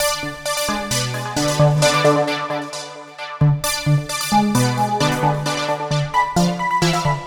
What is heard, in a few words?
Lead Synth